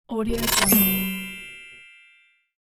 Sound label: magic rattle